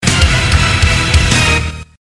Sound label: cool battery tone ringtone